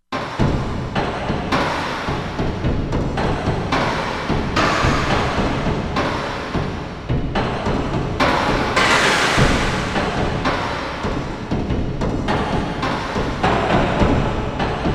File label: SFX, Korg MSC-16S; Environment